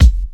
raw kick drum sound F sharp key; kick drum samples

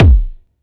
verby kickdrum sample D key; Duration 0:01

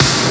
dry snare single hit c sharp key

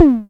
low mid tom one shot f sharp key; tom drum samples

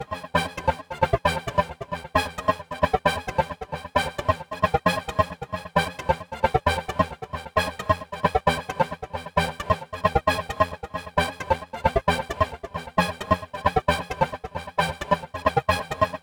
techno lead swing groove gated - em; instruments; loops

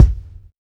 subtle reverb steel kick drum sound f sharp key

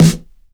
low tone snare one shot e key

